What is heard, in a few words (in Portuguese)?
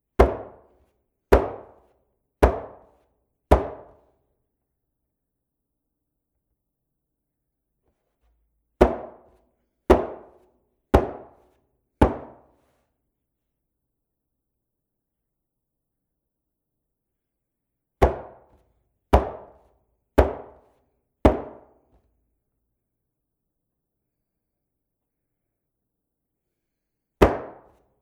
CAMISÃO PEQUENO Toque preso